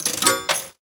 coin drop; slots

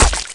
Bullet Water, Impacts

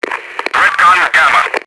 merc noise; enemy; Max Payne

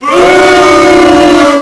boo; WhiteOut; XBOX; trojan

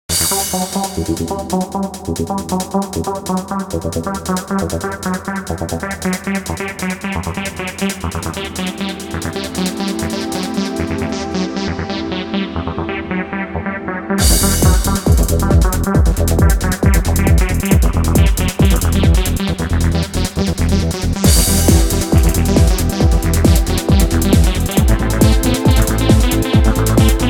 Multiball, Pinball, Music; Pure Pinball

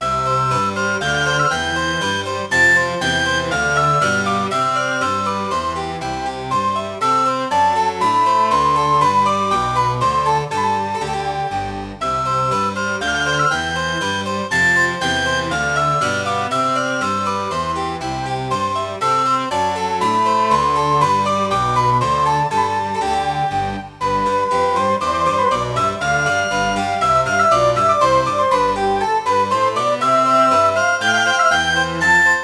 dance normal; Sid Meiers Pirates; XBOX

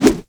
golf hit; Tony Hawk's Project 8; XBOX; houses

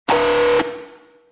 alarm; prototype

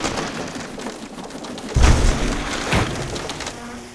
ship dismast; Sid Meiers Pirates; XBOX

